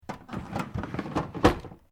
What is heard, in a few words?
Drawer Plastic Cabinet Open Fast; Various domestic drawers; Household